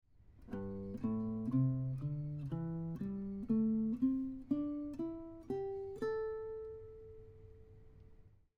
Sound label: A minor pentatonic scale, guitar; This is an A minor pentatonic scale using shape 5